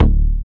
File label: dance bass; Sound & Vision - Gigapack II CD 1